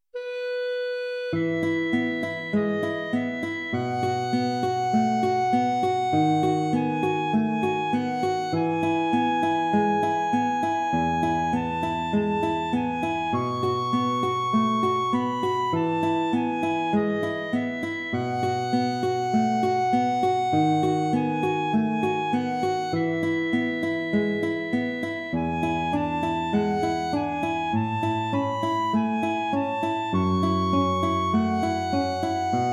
etude major; chopin

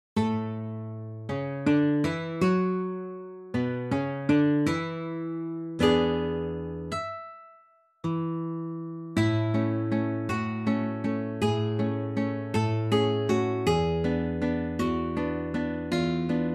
easy pieces for guitar

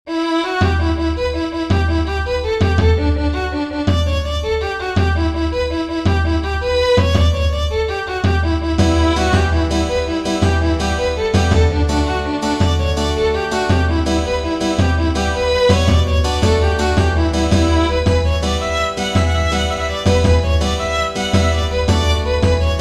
jig

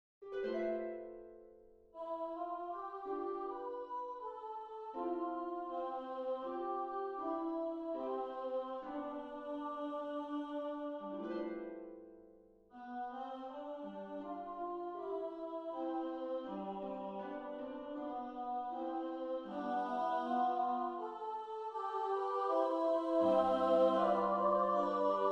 mass for SSA and harp

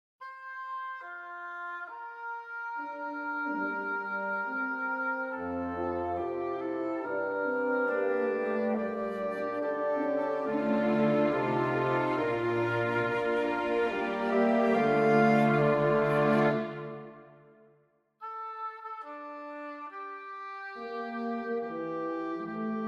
offertory for winds strings; bruckner joseph anton; afferentur regi

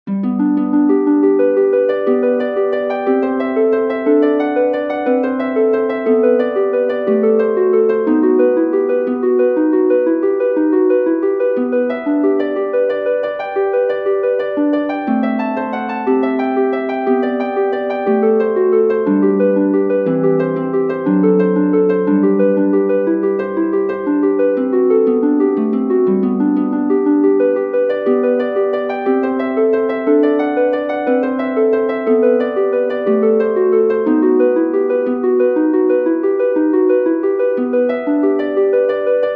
for harp; bach johann sebastian; variation allein gott der sei ehr

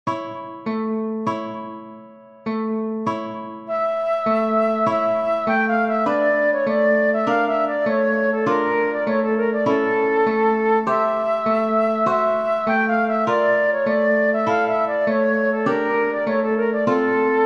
romanian folk dance for flute guitar

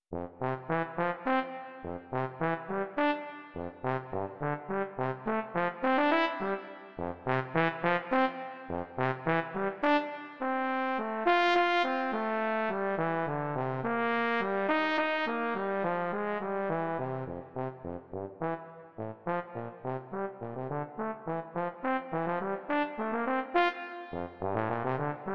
for trombone allegretto energico

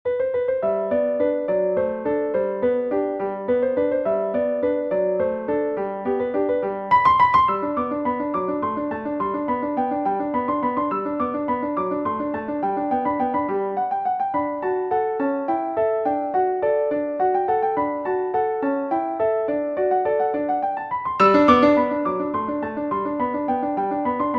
guaracha spanish dance

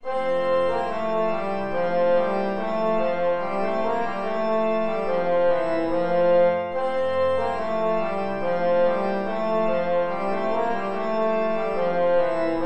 deck the hall

